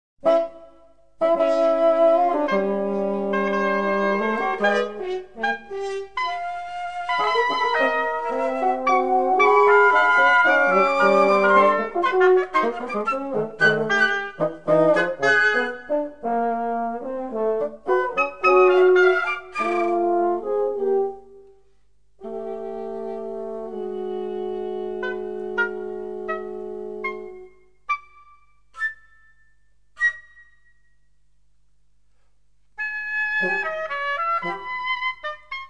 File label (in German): blaeserquartett